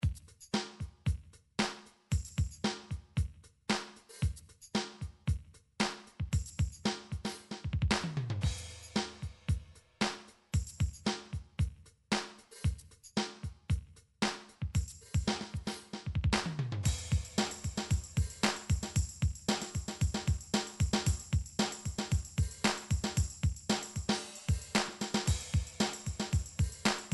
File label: drum midi funk; Drum Midi Files Collection